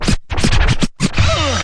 turntable uh, scratching and turntablism; DRUM LOOPS and BREAKS

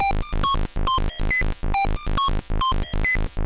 138 offphase bleep, DRUM LOOPS and BREAKS

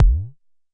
Jomox MBase, drum machine; Jomox MBase 01

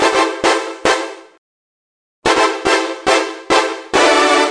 106 giant 80s synth; MELODIC LOOPS; KEYS ORGAN PIANO LOOPS; minneapolis

